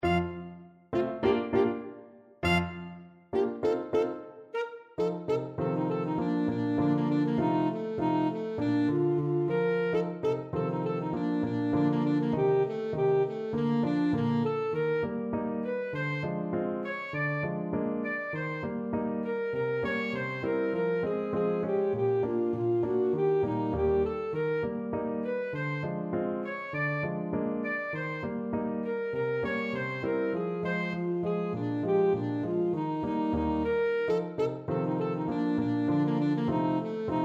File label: pizzicato polka, saxophone